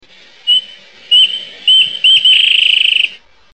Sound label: wren; Wrentit song